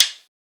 perc roomy, Drum One Shots; SD MIDNIGHT Sample Pack; Slate

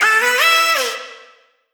Vocal Sample Pack Phrases A

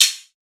hat cool, Open Hat, Drum One Shots; SD MIDNIGHT Sample Pack; Slate

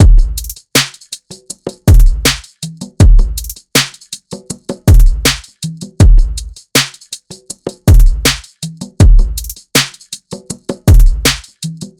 drums 80, Full Drum Loops; Slate; SEISMIC Drum Sample Pack

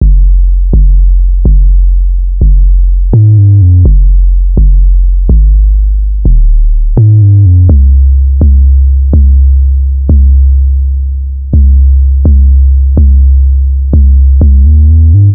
Synth loop 125 Bm, Synth Bass Loops; Slate Digital BASSHOLE Sample Pack

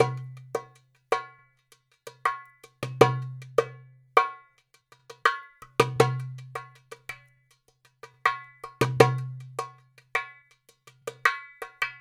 darabuka loop, 80

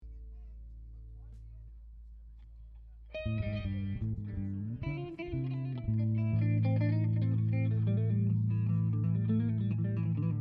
Singalong Mix

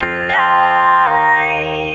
phrase Fm, Vocal; Slate Digital Omnivox Vocal Sample Pack

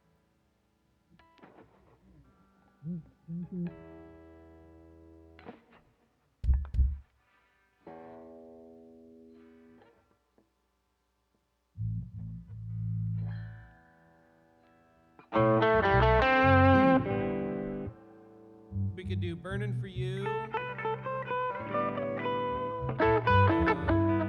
Strings Emphasis Mix

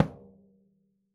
daf Single hit; Raw Perc One Shots; Slate; SD SEISMIC Drum Sample Pack

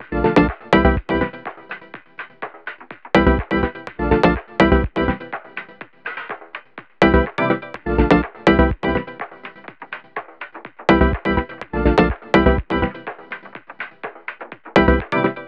songstarter 124 F; Slate; SD PASADENA Pop Sample Pack

